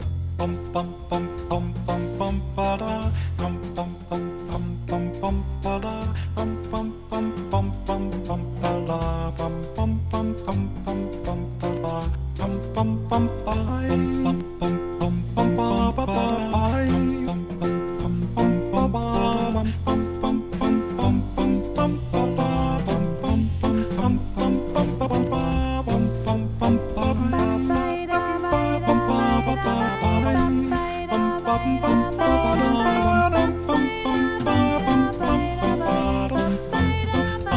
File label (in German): Wednesday Kanon vokal